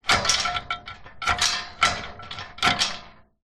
industrial metal gate chained try to open movement